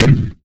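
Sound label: ARTSH HYBR, percussion metallic; Rarefaction; Poke in The Ear With A Sharp Stick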